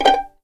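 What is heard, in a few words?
G5 pizzicato mezzo forte right, violins; East West; The Ultimate String Collection; VLNS PIZZ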